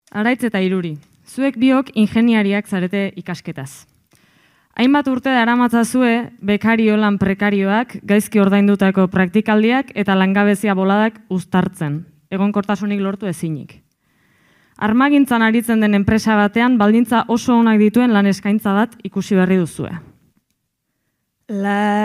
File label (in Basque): bertsoa; zarautz